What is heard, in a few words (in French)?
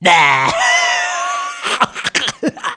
bruit; MOBICLIC41